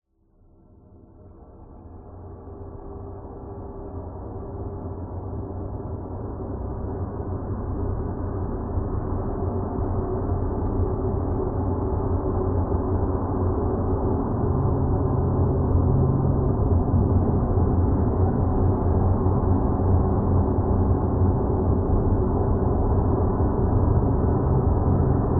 sound design drone dark airy could be spaceship or sci fi; zapsplat